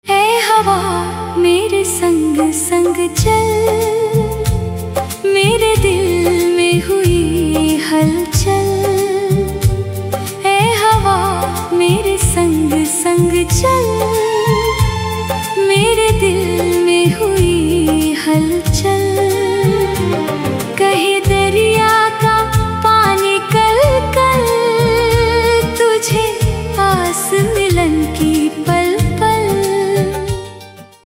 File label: AI Ringtone